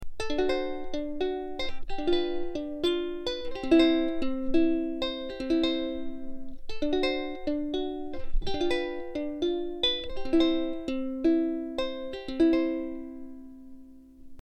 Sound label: Uku